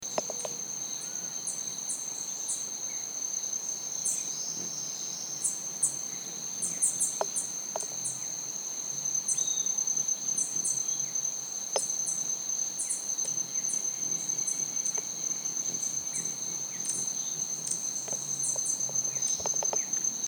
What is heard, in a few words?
Saí Azul, Dacnis cayana, Blue Dacnis; cantos